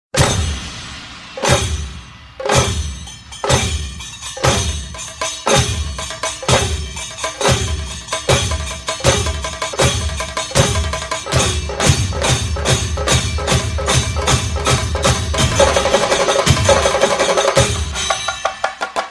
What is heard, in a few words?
Drum; Versus Ringtone (VS)